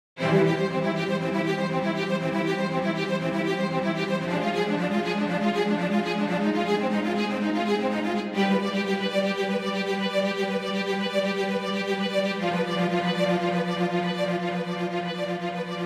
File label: Studio Strings